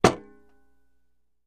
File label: Axe Hits Shield